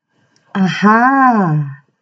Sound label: ahaaaa